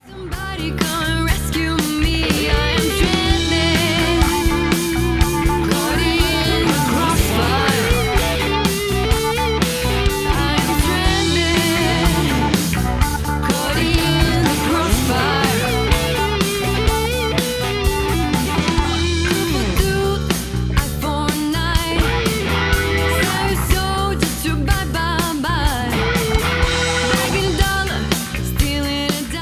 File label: Blues